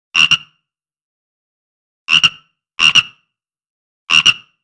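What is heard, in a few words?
animal loop frog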